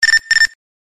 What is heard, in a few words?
bip nextel